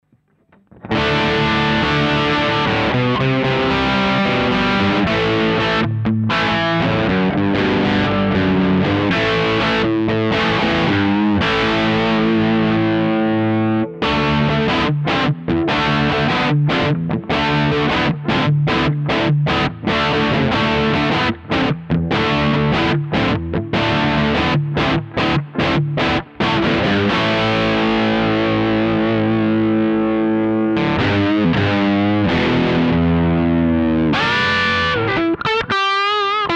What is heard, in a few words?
Overdrive Channel DI